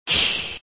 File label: Expire Sound; Extra Lives